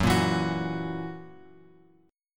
F sharp 7th, guitar; F#7 Chord {2 1 2 X X 2} strummed

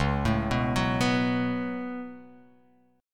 C sharp 7th Suspended 4th Sharp 5th C sharp

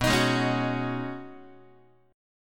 B 6th Add 9th B down Guitar; B6add9 strummed; 4A,4E,5A,5D,5G#,6C#; chord shape; x,7,6,6,5,5; JGuitar

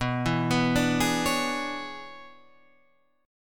E 13th B

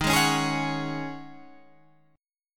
Db Suspended 2nd Suspended 4th Eb

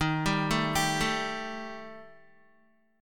Eb Major Flat 5th, Guitar; EbMb5 arpeggiated; 10,10,9,12,9,x; 4F,4A,5C,5G,5C,6E; JGuitar

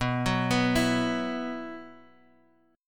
B Suspended 4th B, chord; arpeggiated; Bsus4; 19,21,21,21; JGuitar

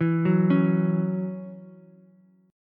C sharp Minor Double Flat 5th C sharp Ukulele; C#mbb5; arpeggiated; JGuitar

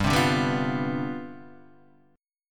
C Major 7th Suspended 2nd Suspended 4th, G, chord

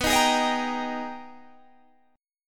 Ab Minor Major 9th

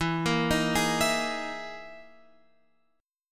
E Major 7th Flat 5th, Guitar, Open F; EM7b5 arpeggiated

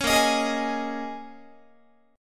A sharp Minor 11th A sharp down Guitar, chord; A#m11; strummed; 16,18,18,18,19,19

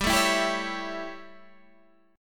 A 7th G, chord

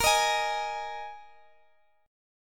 Bb 7th Suspended 4th Bb down Guitar Standard; strummed

